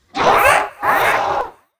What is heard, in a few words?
Crow mauler scream